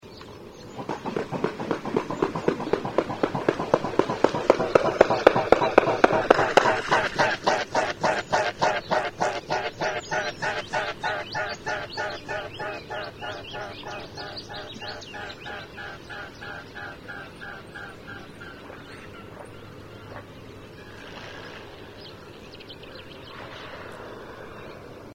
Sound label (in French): cygne tubercule, cygnus olor